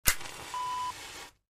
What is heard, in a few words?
matches